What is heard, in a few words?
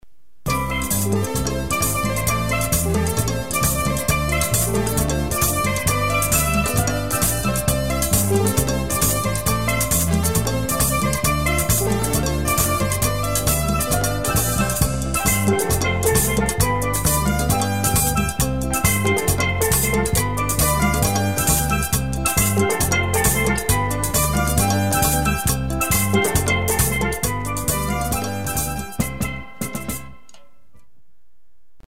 steelpan merengue